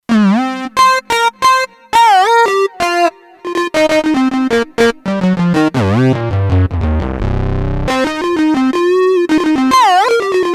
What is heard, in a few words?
Funky Lead, Kurzweil K2500X; Factory Programs